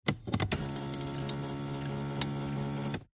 vehicles car luxury electronic seat adjust motorised